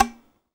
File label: TZOU PIZZ G 2; Soundscan vol.53; Mediteranean Traditions; AKAI